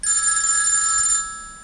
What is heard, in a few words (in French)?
echantillon telephone